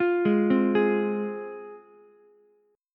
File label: F Minor F down Ukulele; arpeggiated; JGuitar